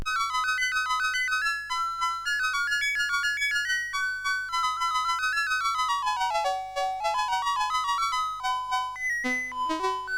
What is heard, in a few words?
Soundwave Tubular Bells